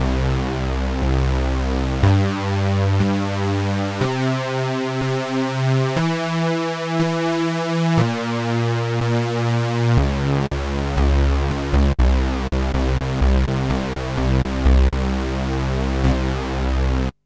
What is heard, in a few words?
OBXa vs soft synth